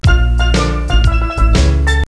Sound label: steel drum; Victor Vector & Yondo, The - The Cyberplasm Formula